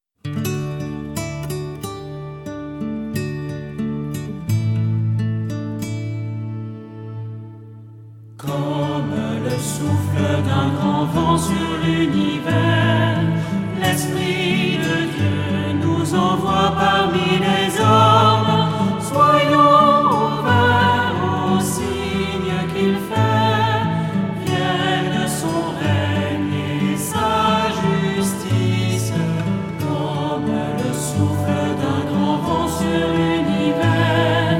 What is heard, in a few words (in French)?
Comme le souffle d'un grand vent